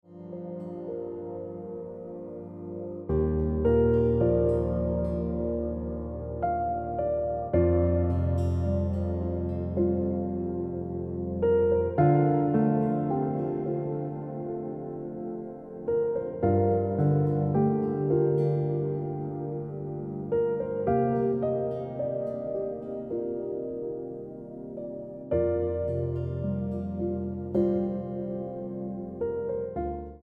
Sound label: Ringtone